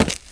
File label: step; player